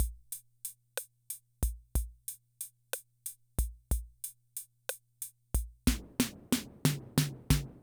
Loop, rhythm 55, classic machines

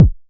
Click; Clicks

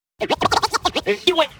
Vocal Scratches 140BPM

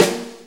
Snare C, Snare Drum; Sonic Foundry (Sony Creative Software); Drum Tools

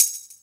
SHAK, SHAKERS; Best Service Dance Mega Drums

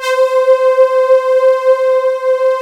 C5, Synth; Big Fish Audio; Synth City; IMAGES

